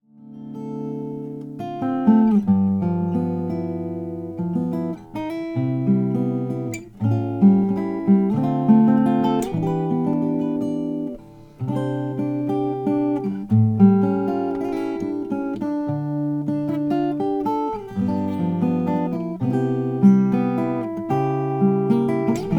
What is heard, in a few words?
flame ancient kauri; kiwi; laurie williams